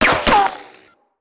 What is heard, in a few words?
Bullet Ricochet; Sound Ideas; Guns